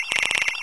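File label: combat robot scan; hl2; combot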